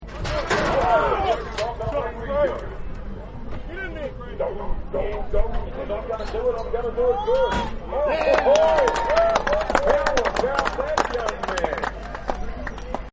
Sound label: Rodeo Starting Gate; Backgrounds; Partners In Rhyme